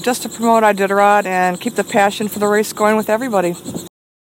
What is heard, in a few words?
Laugher